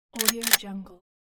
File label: shotgun cocking; fascinatedsound